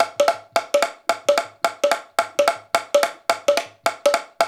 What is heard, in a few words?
108 MANJA, African Ethnic Drums; Spectrasonics; Supreme Beats